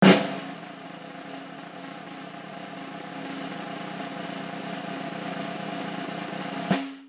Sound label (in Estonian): trumm pinevus